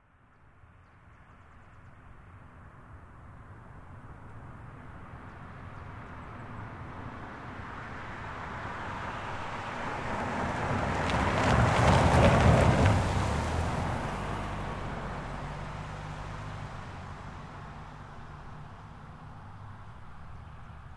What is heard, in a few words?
Car Highlander By Corner Dirt Slow; 2005 Toyota Highlander V6; Vehicles; Cars; Big Room Sound